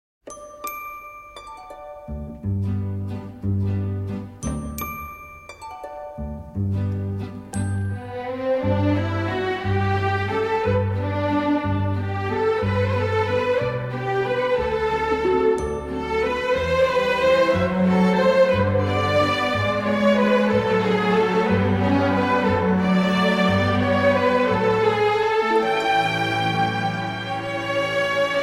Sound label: Waltz From Serenade For Strings